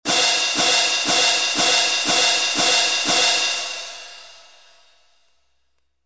cymbals sound

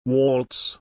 waltz

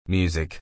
music

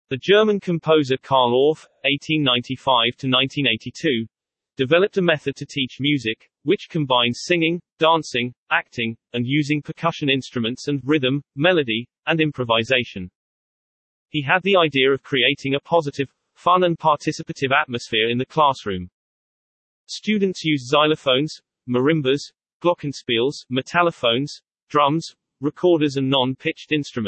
orf instruments

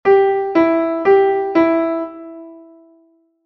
4 notes

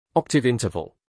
octave interval

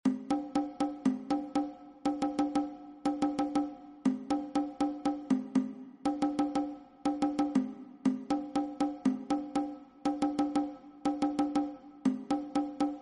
Yembe; Siyahamba

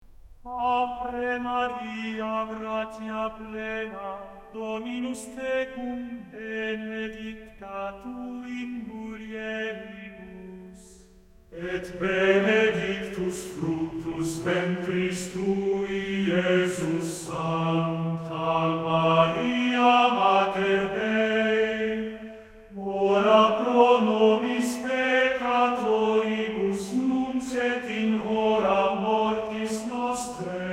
ave maria latin catholic gregorian chant

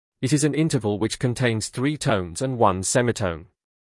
perfect fifth interval

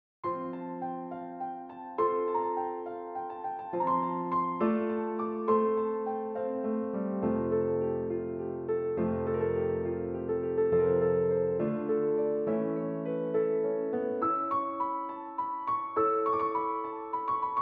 arr for piano; grieg morning mood peer gynt suite no1 op46

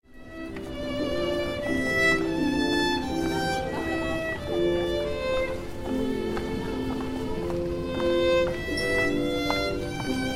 Street musician violin

